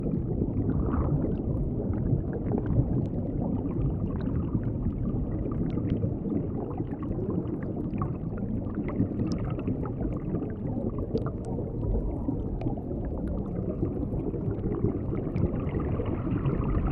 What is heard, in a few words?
Ambience; Piranha Plushtrap; Five Nights at Freddys; FNAF Special Delivery; android ios